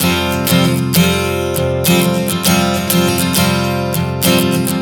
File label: Strum 100 F9, One Chord Strumming 100 bpm